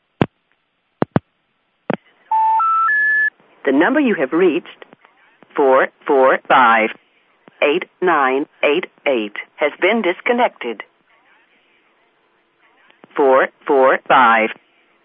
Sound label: old, vintage telephone sounds; miscellaneous vintage sounds